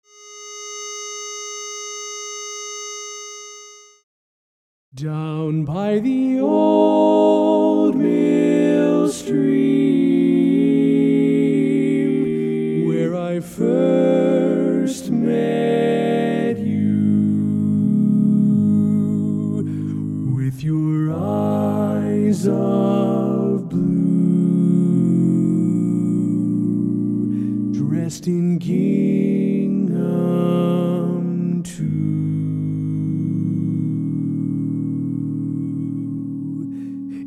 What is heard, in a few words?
Lead, Barberpole Cat; Barberpole Cat I